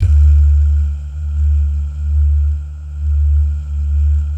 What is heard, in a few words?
"DAH", Vocal Jazz Fx